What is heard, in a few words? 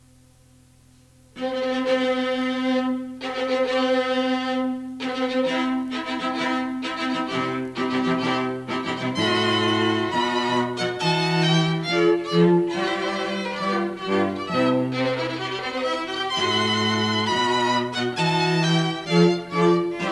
Wedding March